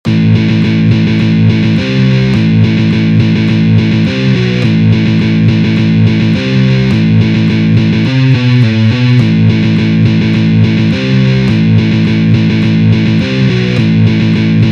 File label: Electric guitar riffs